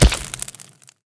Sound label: egg Hit; Crawler broodmother